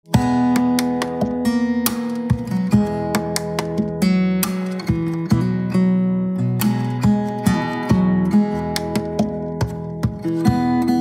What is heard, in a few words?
Guitar, instrumental ringtones